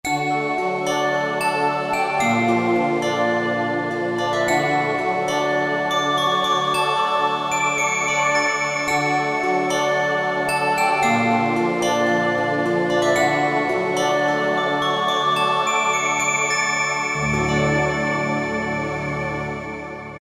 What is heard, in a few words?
Bell Choir, Alesis Z3; Sanctuary